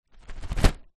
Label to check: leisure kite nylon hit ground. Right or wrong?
Right